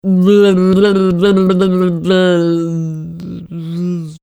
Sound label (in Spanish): friccion